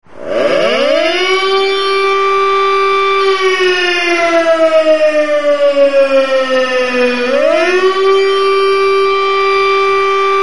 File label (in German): sirene zivilschutz alarm